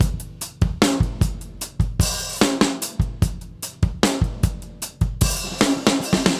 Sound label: Beat Loops; Zero-G; Monster Beats